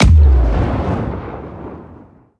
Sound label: supersonic, car